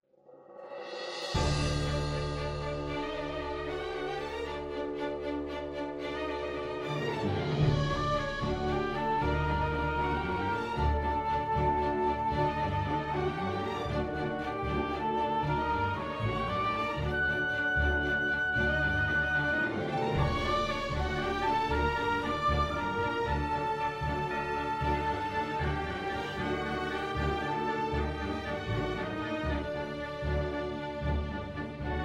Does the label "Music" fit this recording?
Yes